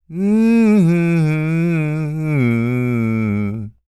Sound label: SING, Blues Soul